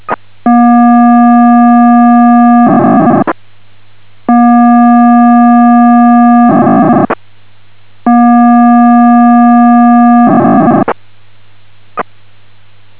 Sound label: 39.5000 Mhz Pocsag 512